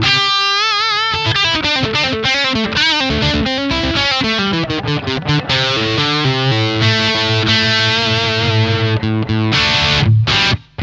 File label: bridge, gibson pick